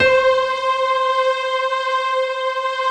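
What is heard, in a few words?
C 4, PIANO CHOIR; Best Service; Advanced Media Trax Modern Composer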